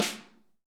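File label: snare ambient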